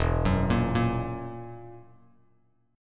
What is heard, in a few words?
E Suspended 2nd Flat 5th E down Bass Standard; arpeggiated; JGuitar